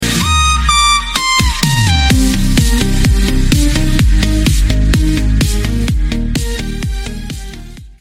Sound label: Ringtone